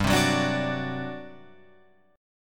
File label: G Major 7th Suspended 4th, G, down, Guitar, Open F; GM7sus4; strummed